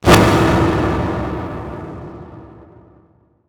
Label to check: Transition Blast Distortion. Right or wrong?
Right